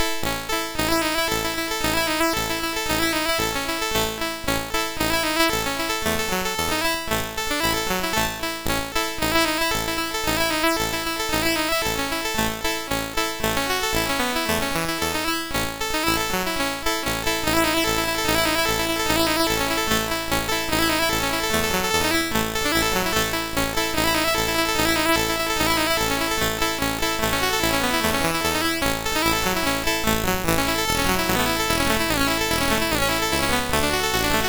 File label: Shin Kicking Music, MOS6581R3